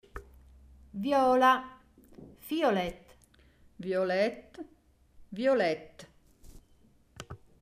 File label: viola